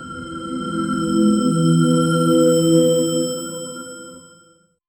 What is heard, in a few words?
global alert tone, digita